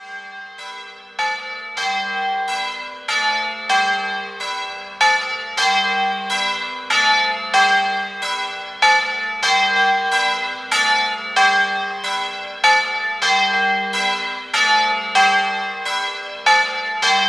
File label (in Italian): Barco, campane